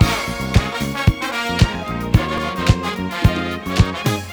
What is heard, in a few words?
Horn loop; some horns and strings; disco sample